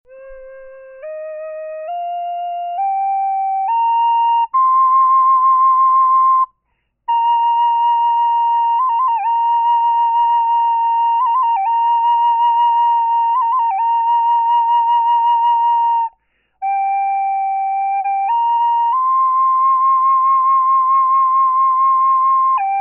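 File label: natural, wood flute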